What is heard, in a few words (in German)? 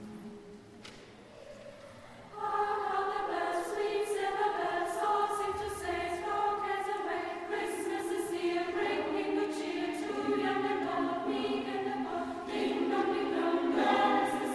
Chor und Jubilaeumschor